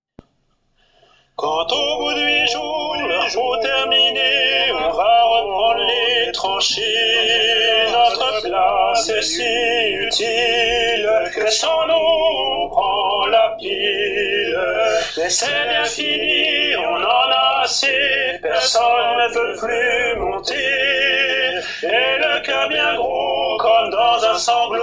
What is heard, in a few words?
soprano